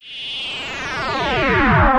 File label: backward